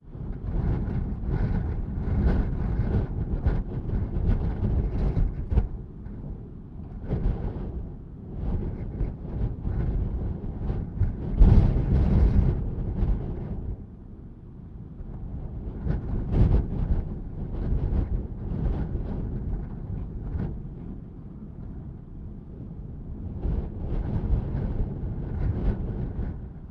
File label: wind strong indoor attick window near field; fvtt sonniss gdc audio